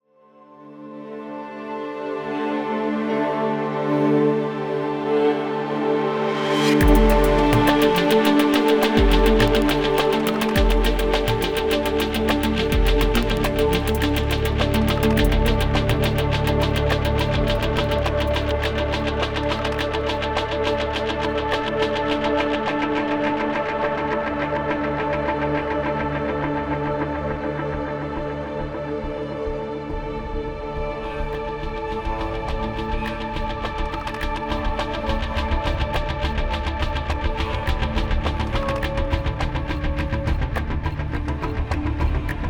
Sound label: Rhythmic, Thematic